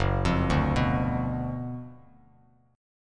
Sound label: F sharp Major 7th Suspended 4th Sharp 5th, F sharp, down, Bass Standard; F#M7sus4#5 arpeggiated